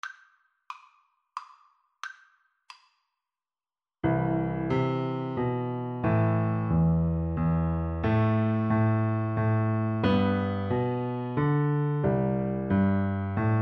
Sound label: piano duet